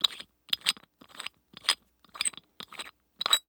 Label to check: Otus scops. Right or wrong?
Wrong